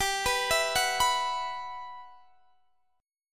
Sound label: E Suspended 2nd G; arpeggiated; Esus2/G